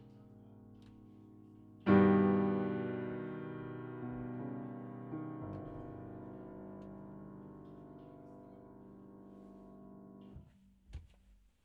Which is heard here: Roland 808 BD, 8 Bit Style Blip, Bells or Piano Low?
Piano Low